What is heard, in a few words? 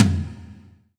long tail reverb tom drum single hit e key, tom sample tuned to the E note. Loudest frequency: 975Hz